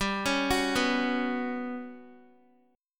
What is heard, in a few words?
G Major 7th Flat 5th, Guitar